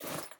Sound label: Pick Up Item